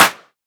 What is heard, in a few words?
hand clap f sharp key